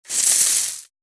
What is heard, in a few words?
Reptile tongue flicker